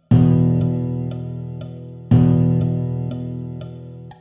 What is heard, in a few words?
Rhythm example, blues